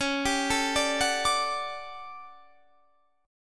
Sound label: A 13th C sharp, guitar chord